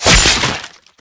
Hit Organic

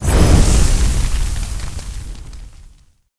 Burn; Providence

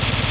Photo Hit Sound

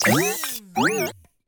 Wulfrum Droid Repair

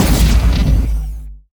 Pulse Rifle Fire

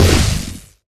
Tesla Shoot

